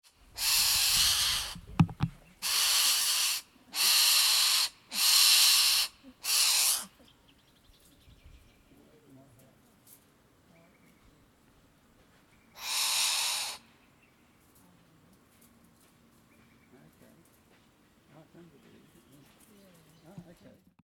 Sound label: Windjana Gorge